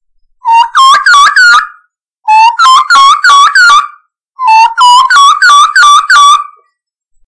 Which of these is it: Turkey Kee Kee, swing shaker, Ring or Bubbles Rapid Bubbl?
Turkey Kee Kee